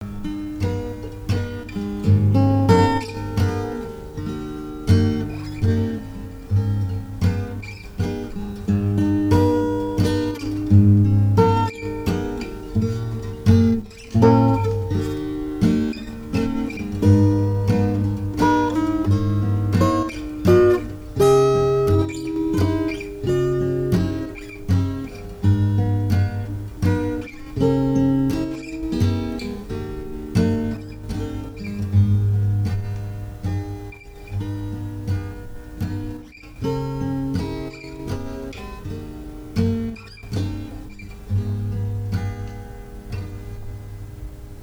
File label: Russian Waltz